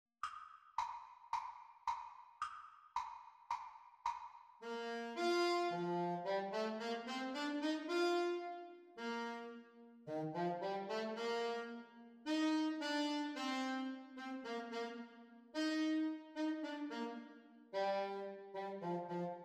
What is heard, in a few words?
Sax Duets Playalong; Alto Saxophone duets; Exam Speed; Grade 2; The Teacher part